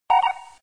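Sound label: elevator beep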